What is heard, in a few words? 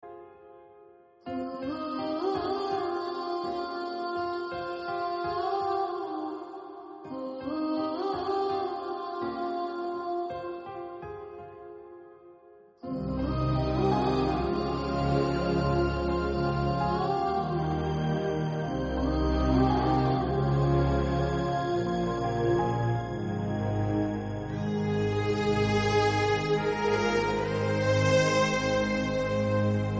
intro bgm, telugu; bheem; rrr